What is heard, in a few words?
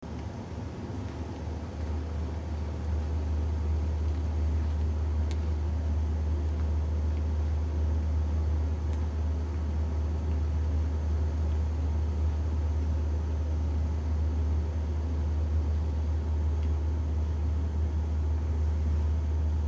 HUMREC, Brummton